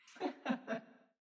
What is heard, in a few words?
laugh; CrossCode